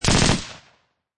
Machine Gun Heckler and Koch MP540 Short Burst; Short Burs Triple

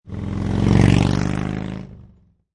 Motorcycle Harley Davidson Drive By Center; Motorcycle, Motorbike Harley Davidson. Drive by, center.

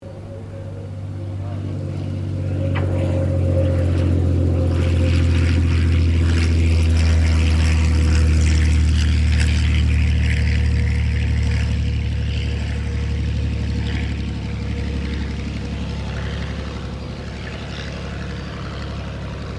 AIRCRAFT P-40 KITTYHAWK LAND; P-40 Kittyhawk / Warhawk / Tomahawk; Airplanes; Vehicles

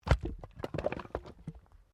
Wood Log Fall; Material components for constructing more complex sounds; Materials

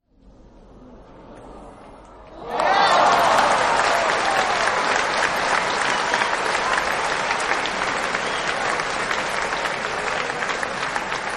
Applause Ahh Yes; Applause Ahh Light; Human; Partners In Rhyme

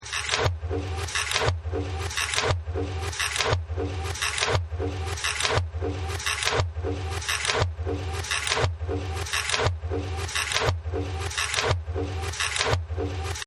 Factory; Factory 15

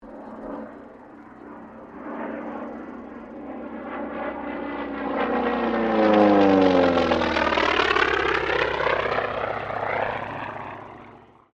AIRCRAFT T-28D TROJAN PASS; Airplanes; Sound Effects:Vehicles

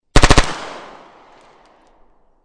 MP5 9mm 3 Burst, Machine Gun; Machine Guns; VRSonic

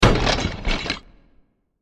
Long Impact With Various Wood And Metal Particles; Burst; Crafted Signals

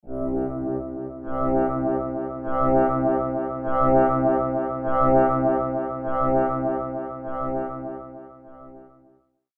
Sci-fi Engine Room Amber Alert; Sf Engine Room - Amber Alert; science fiction sound effect

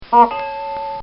Honk And Bell; Bell Sound Effects; Musical

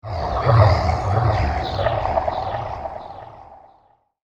Evil Dead Zombies; horror; Evil Sound Effects

